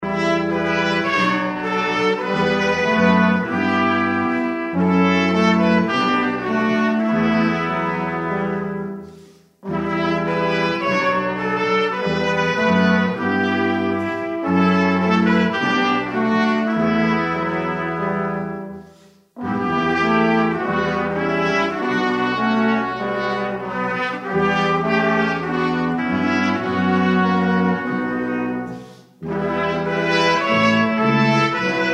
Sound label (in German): Korn das in die Erde Posaunenchor